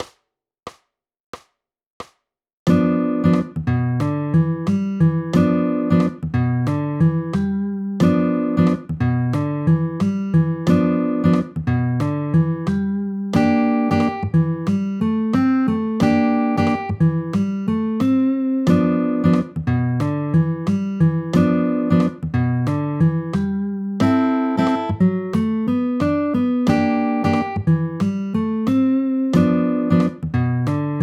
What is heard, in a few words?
Funky Blues Groove in G 90bpm